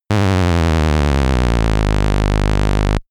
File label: synthetic signal